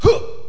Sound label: jump; Alien Arena; infantry